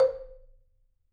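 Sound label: C4, Marimba dead note; Sampleheads - Dave Samuels Marimba & Vibes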